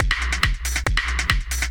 Tech loop

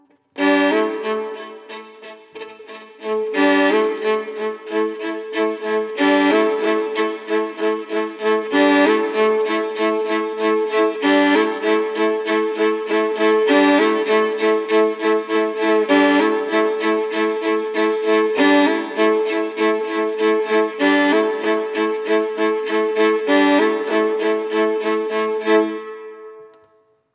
Bluesy Violin Repeating Lick

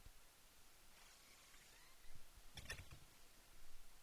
Door Close

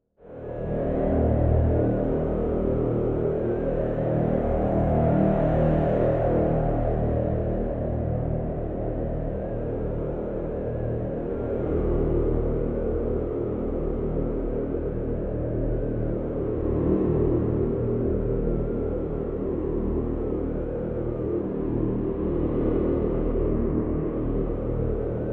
Stormy

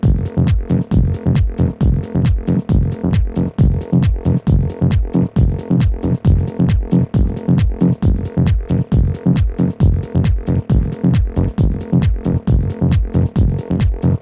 Poot Raw 135bpm

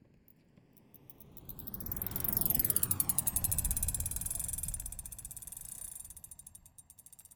Doppler bike

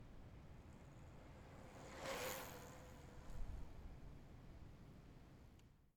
Bike Zooming Past

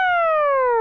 Game Over

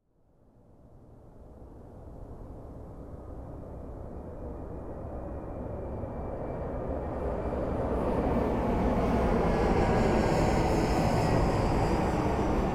Jet

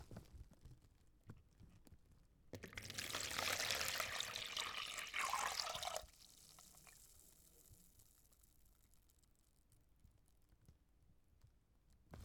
Water

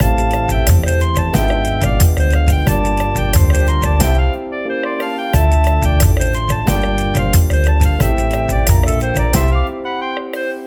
Samba Game Theme Loop

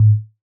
Menu Click

